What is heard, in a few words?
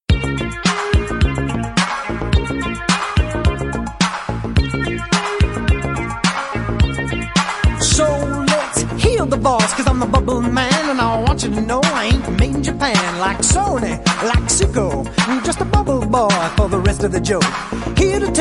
Bubble Rap